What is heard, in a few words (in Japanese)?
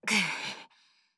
くっ…